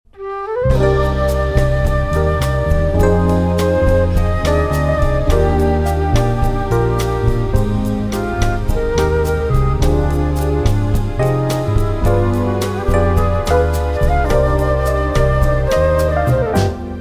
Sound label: Latin Dance